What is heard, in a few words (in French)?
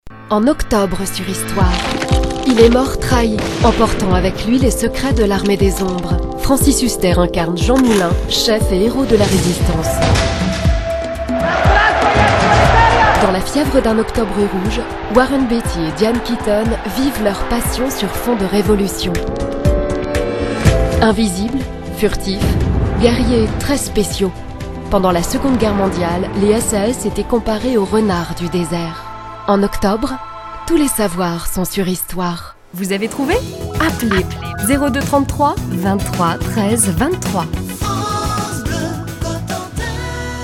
habillage